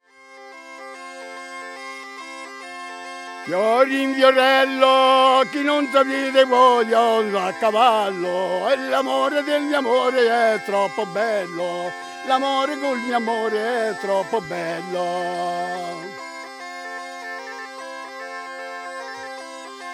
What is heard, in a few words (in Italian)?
strambotti alla zampogna